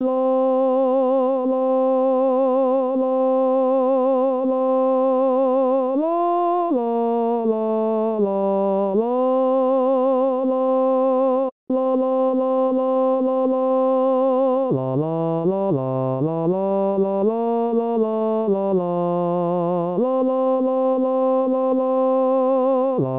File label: tenor; Kousk